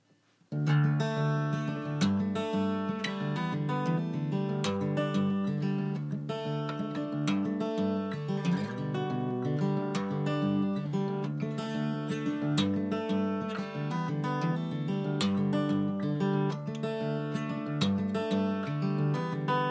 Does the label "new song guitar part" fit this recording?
Yes